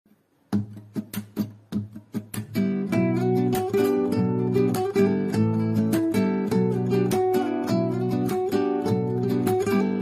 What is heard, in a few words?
Guitar